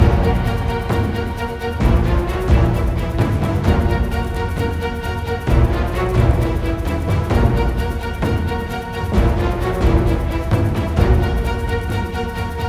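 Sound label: background music battle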